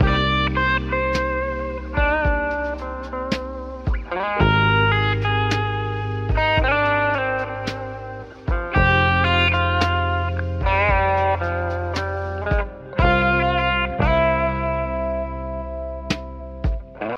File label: guitar, loops; Phonky